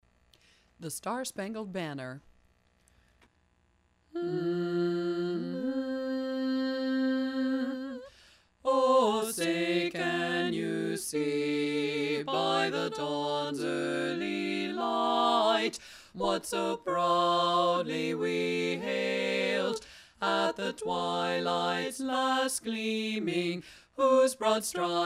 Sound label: lead miss